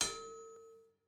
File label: ambience room polygon hammer training